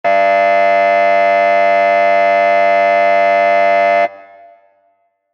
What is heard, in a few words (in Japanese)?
劇場やホールの開演ブザー リバーブ有り; 戏剧音效